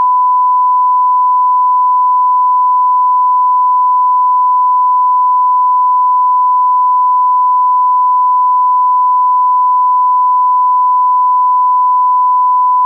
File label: Sine 1000Hz stereo; 192kHz; 24bit